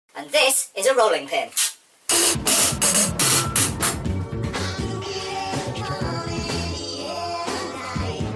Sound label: rolling pin